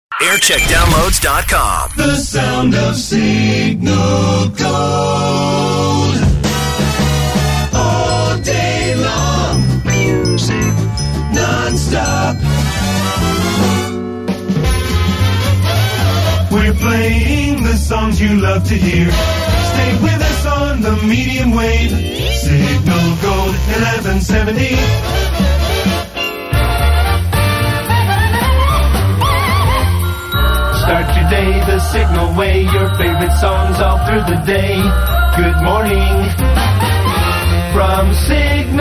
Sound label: jingle montage; Signal Gold Stoke-On-Trent; Jingle Montage (PAMS) 1993; Signal 2; 1990s